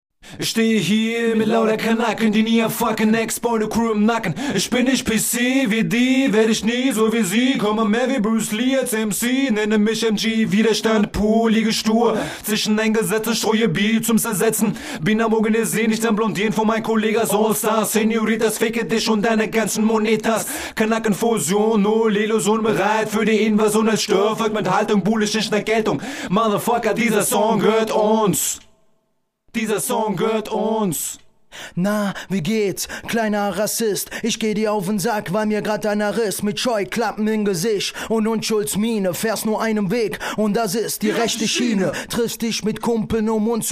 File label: song acapella